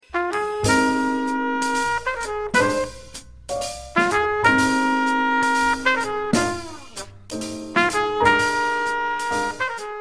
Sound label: minus BASS; bassist should get the Bass part; count off sets up the intro; walk for the intro 1 chorus (cymbal hits have been added